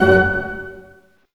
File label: HIT STACO, Staccato Oct; String Master Series; Dynamic Orch